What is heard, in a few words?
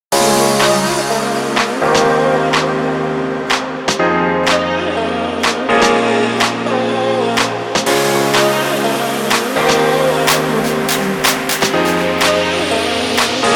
deep house